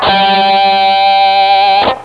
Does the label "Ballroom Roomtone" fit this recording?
No